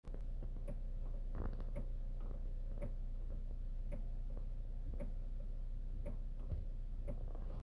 normal brake pedal make friction clicking noises brake clicking; cr-v